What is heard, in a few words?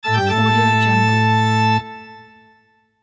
halloween bach organ